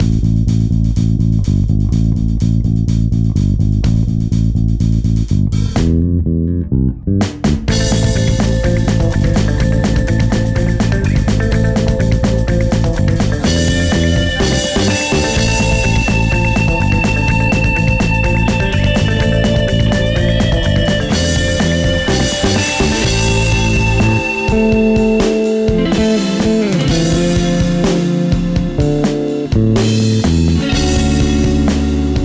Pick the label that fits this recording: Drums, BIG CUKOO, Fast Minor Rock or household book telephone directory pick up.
Fast Minor Rock